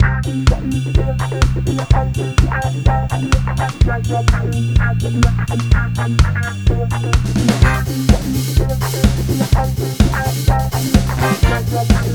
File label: 70s disco